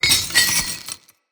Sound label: Balloon Chain Detach; Encounters